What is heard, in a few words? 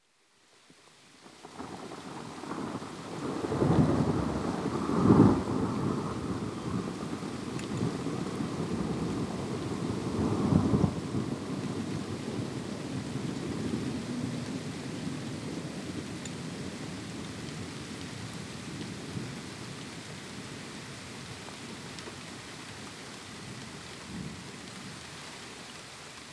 Thunder roll (with rain); Weather; The BBC Sound Effects Library